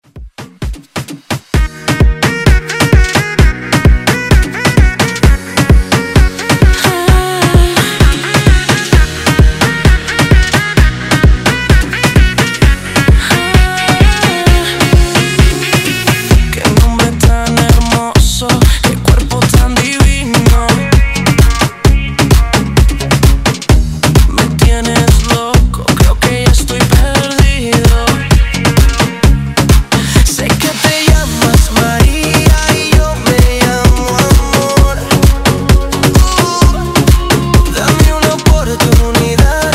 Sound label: Afrobeat Latino Redrum